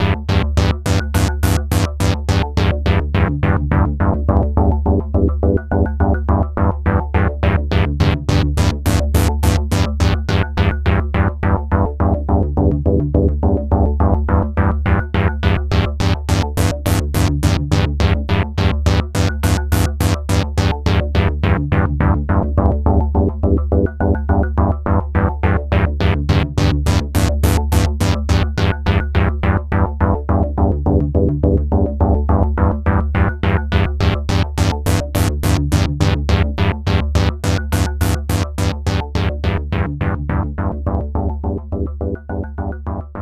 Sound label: angry moody idle loop; the warriors 2005 ps2